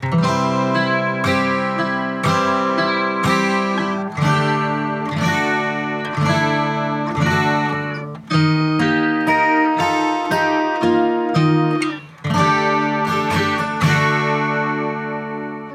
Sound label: Guitar 4 1 Ratio Attack Release 45 dB GR Peak Dual Modus; VSC 3